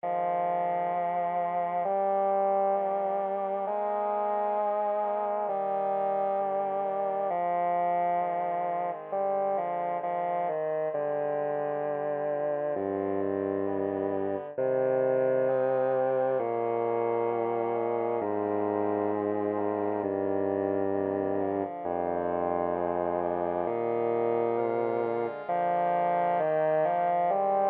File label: B Humming